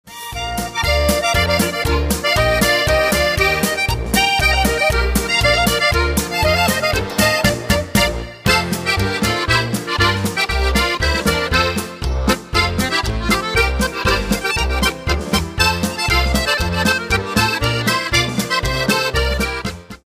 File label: Polka